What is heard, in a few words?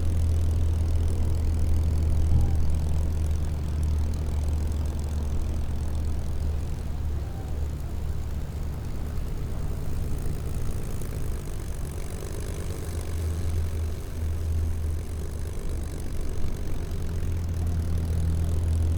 indoor cabin rattle; LOOP; anemoi